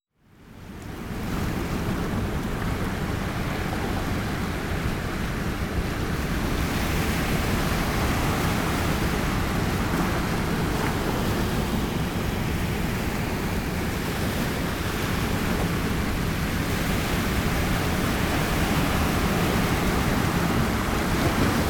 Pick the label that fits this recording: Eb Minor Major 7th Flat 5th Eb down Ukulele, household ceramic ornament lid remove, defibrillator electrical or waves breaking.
waves breaking